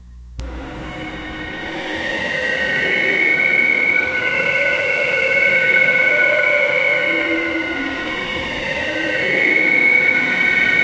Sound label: creaking and rhythmic sound, ghostly